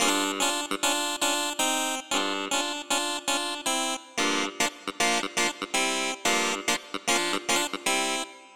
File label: Clavi; Ivories